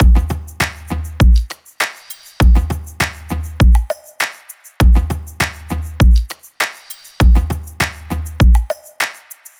drum loop 100; Pop; Drums; Full Drum Loops; manzanita; Slate; SD PASADENA Pop Sample Pack

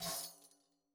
daf Single hit; Slate; SEISMIC Drum Sample Pack

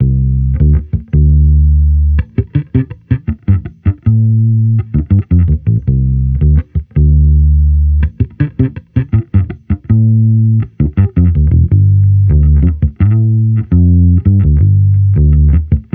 Amped loop 82 Dm, Electric Bass Loops; Slate Digital BASSHOLE Sample Pack

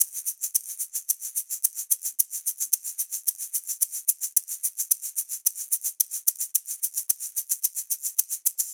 afro shaker loop, 110

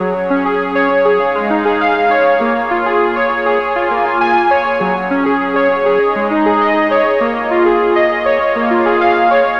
songstarter 100 Bbm; Slate; SD PASADENA Pop Sample Pack

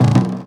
tom pallet; Drums; One Shots; Slate; AURORA Sample Pack; Toms

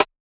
perc rimshot, Hip-Hop Drum One Shots; SD CAICOS Hip-Hop Sample Pack; Slate

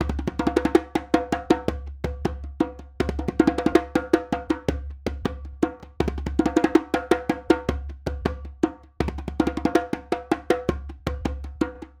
djembe loop 80; perc construction loops; SEISMIC Drum Sample Pack; Slate

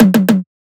tom, Drum One Shots; Slate; SD MIDNIGHT Sample Pack; Toms

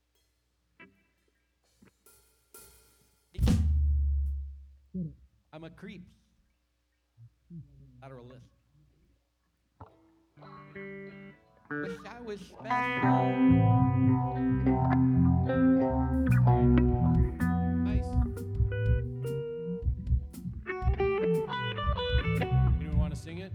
Strings Emphasis Mix

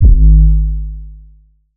808 one shot Ab; Slate Digital BASSHOLE Sample Pack

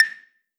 Perc Clav Crisp, 909 Percs, Vintage Drum Machines; Slate

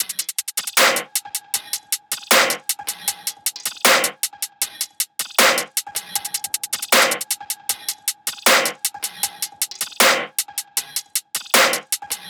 tops 156, Drum Sample Pack, Loops, Top Loops; SD SEISMIC; Slate

